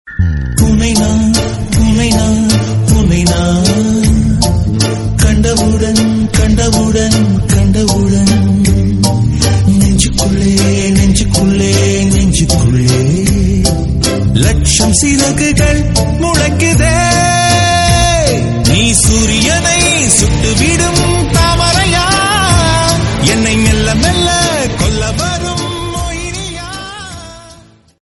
Tamil Song

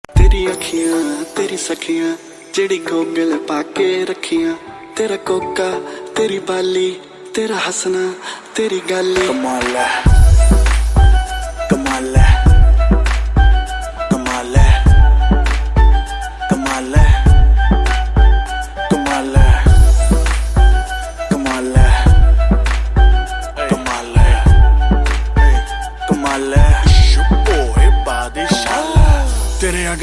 Romantic Hindi

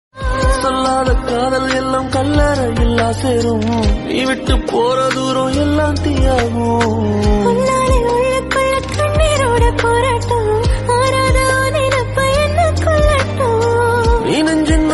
Remix Tamil

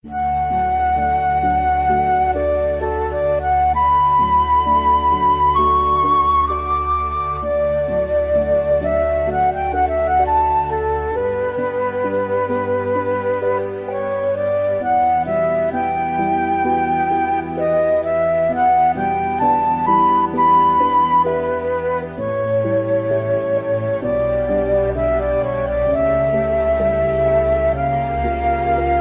Meditation

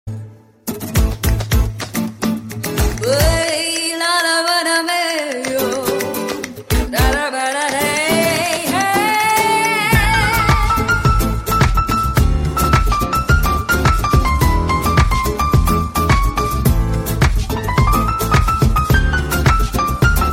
Excellent Guitar Spanish

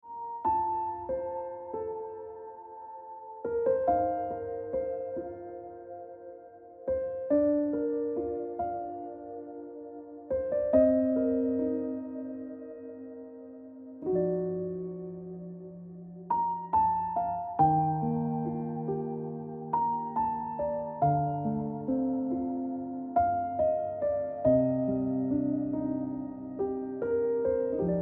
Piano Music

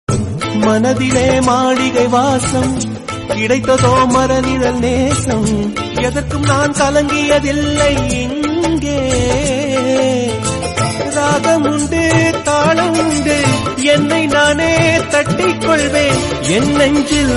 Tamil Song Ringtone

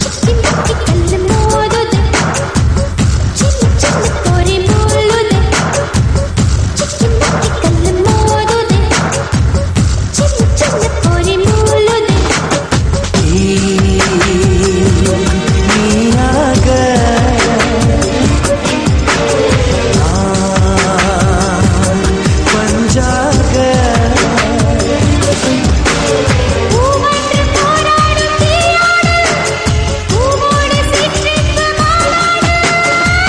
Tamil Song Ringtone; Citizen

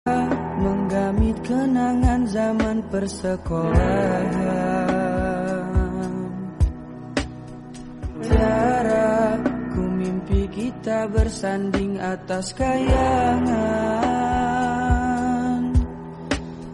Malay Song

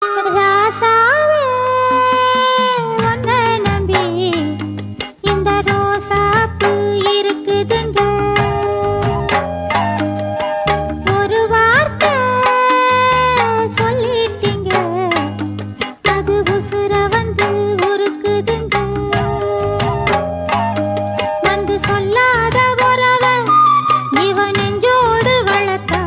Tamil Song Ringtone; Muthal Mariyathai

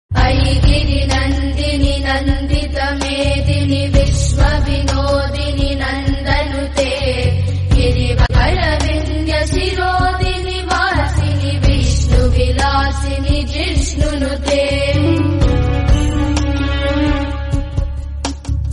Songs Tamil Amman South Indian; Sami

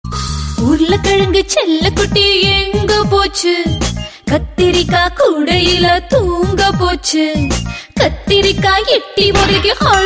Tamil Song

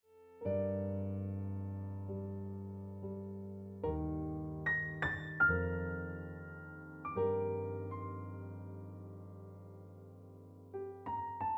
Piano Music

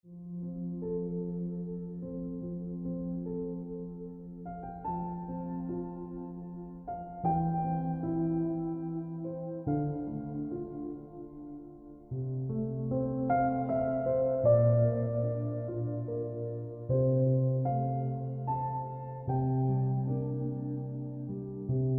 Ringtone Piano Music

